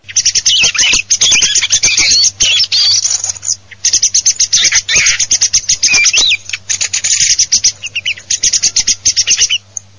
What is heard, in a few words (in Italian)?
schiamazzo